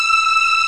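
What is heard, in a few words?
arcato string; OMI - Universe of Sounds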